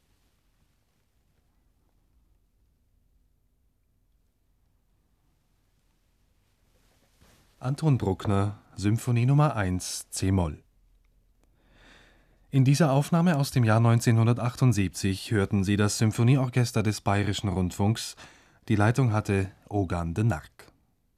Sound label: Applause Announcements